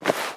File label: RattleSnake